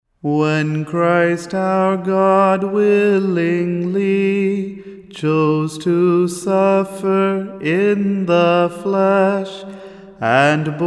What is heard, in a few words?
Pentecost antiphon